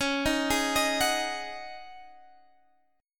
Db Suspended 2nd Suspended 4th, Guitar, Half Step Down